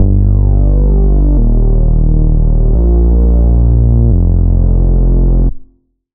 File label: Bass; Routed Sound; Spire Expansion - Flame Summoner Vol.1